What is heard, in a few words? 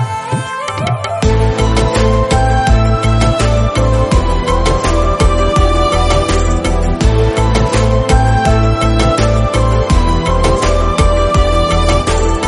Instrumental BGM